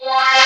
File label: VOC HIT, VOCODER; Zero-G Ambient 1